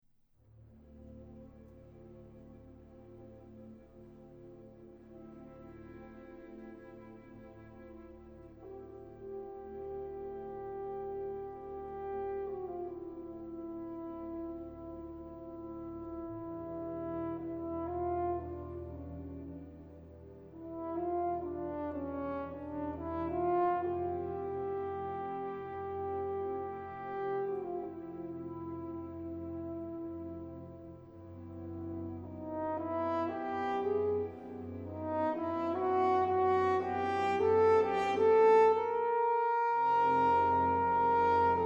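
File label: Horn Concerto 2nd movement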